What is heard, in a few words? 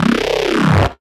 C Bass, DnB One Shots